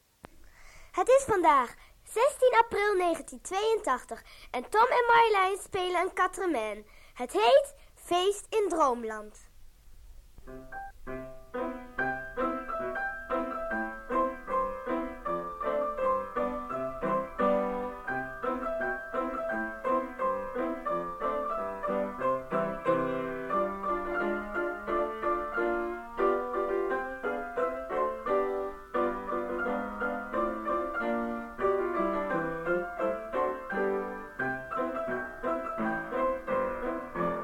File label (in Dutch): piano; muziek cassettebandje liedjes